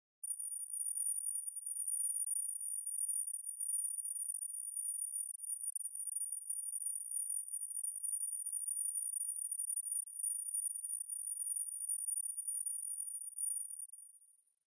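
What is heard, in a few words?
Noise HF Elect Whistle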